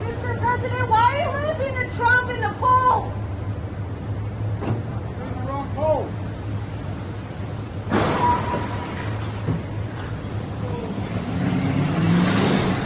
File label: CAR CRASH MOTORCADE; PRESIDENT BIDEN